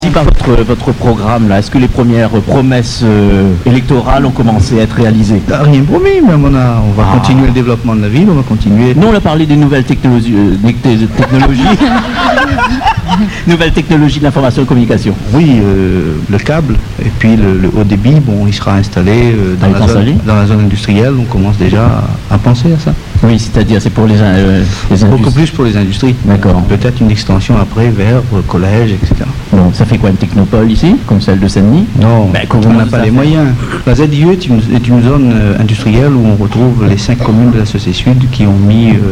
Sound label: techno